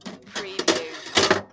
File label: Pinball sound, ball move on rail and drop